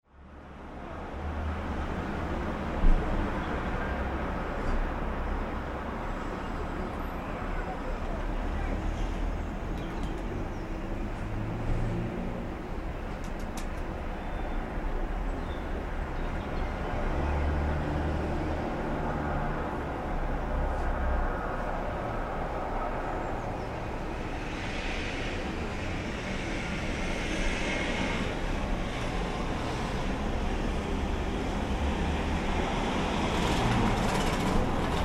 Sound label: london ambiance; recorded with jeklin disk and zoom h4